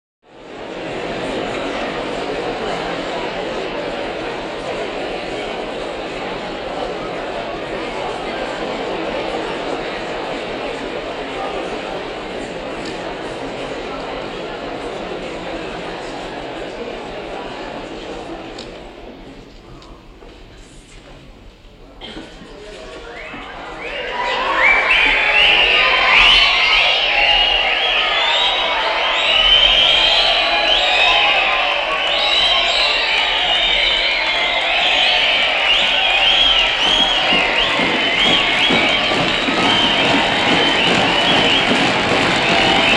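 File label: Interior Large Crowd Cheer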